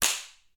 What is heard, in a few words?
High Five; LOOPERS